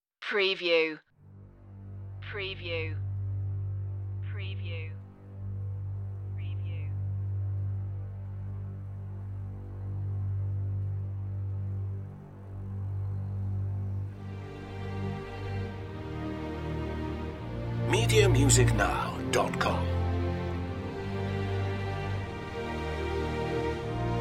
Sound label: intro part all strings